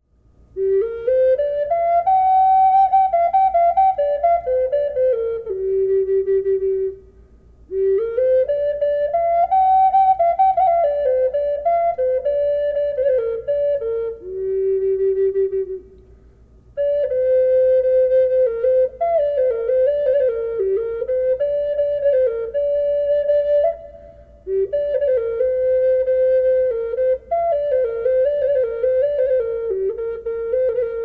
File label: myrtlewood burl reverb, flute; carved horse